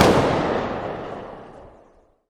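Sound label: negev distant, gun; arccw go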